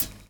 DRUM CHOP; AMG Gota Yashiki Groove Activator

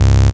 Dance Mega Synth; Best Service; 90 s Sample CDs

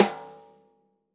snare snares on; JazzFunkKit

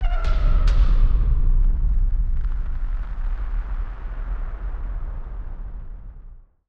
Firework FX; musicradar; bomb factory

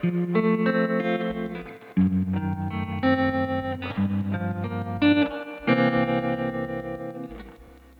Trem Guitar, 70s Analog Funk; Sonic Foundry (Sony Creative Software); What it Is!

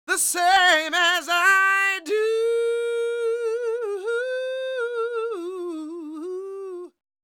croon, Vocal Planet Blues Soul; Spectrasonics

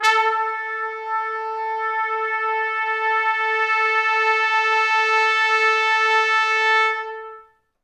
A3 -L, Pop Brass; Best Service; ProSamples vol.19; AKAI

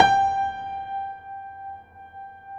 PED FF, GRAND PED; Best Service Advanced Media Trax Modern Composer

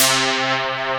BOING; E-MU Formula 4000 Series Vol. 3 – Analog Odyssey; Electro Perc

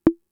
Conga, Fricke MFB-522

